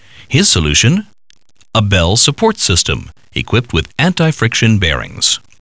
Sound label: BELLS